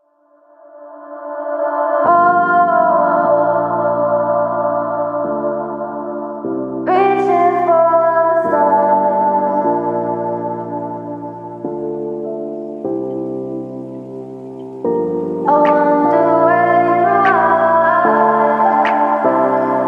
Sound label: Future Bass